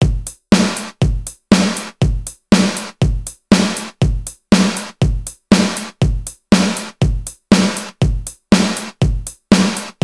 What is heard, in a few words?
gated reverb with reverb and gate